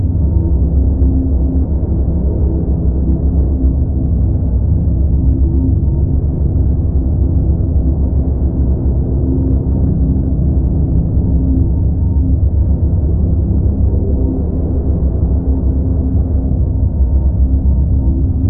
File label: amb gatebuilder space station; Stellaris; distant stars